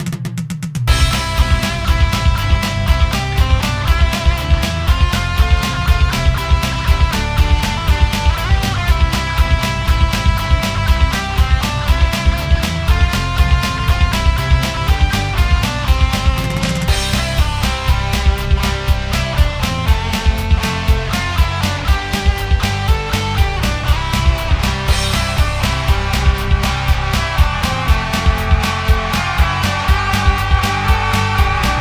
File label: Jingle Medium